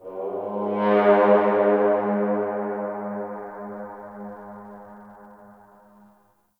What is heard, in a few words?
Temple Far Horns; Ambience; Corpus Ship; Temple One